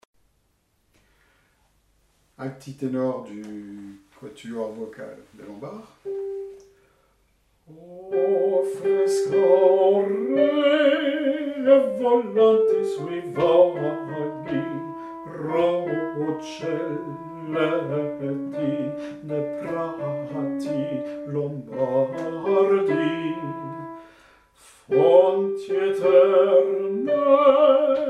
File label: alti tenor